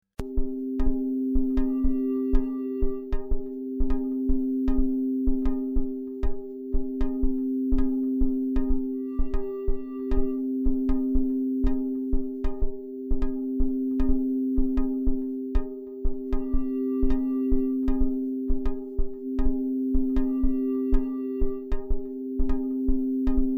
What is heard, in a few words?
electric kettle; nachtasyl